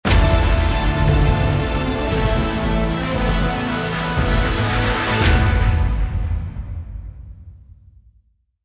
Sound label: freespin congratulation; Rabcat; Classic 243